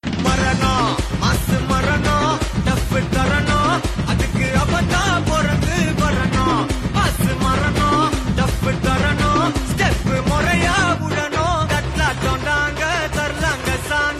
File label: theme ringtone; tamil; petta